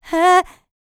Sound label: LICKS, Vocal, R&B; ILIO; Dance; LT R+B